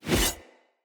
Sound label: Axe Whoosh Heavy; Weapons; Enemy; Axes; Glades Axe